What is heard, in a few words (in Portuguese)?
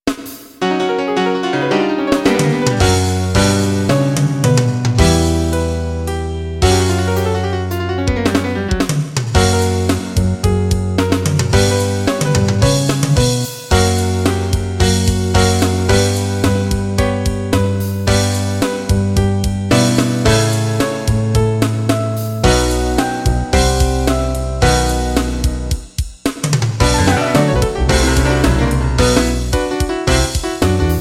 piano